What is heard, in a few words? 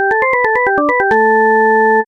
win and lose melodies - basic win